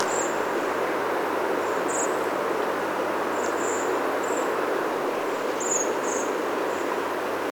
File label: cbbird merula SEV FC; groie